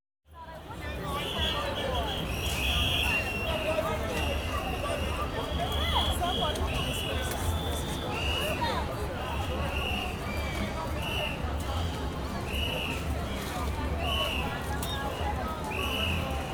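Carnival, exterior atmosphere ambience with people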